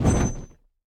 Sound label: dagger flame; smite; pele